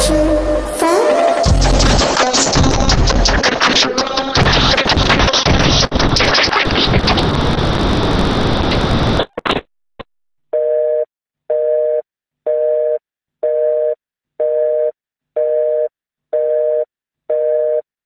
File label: band